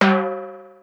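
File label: Timbale High, Yamaha DD-35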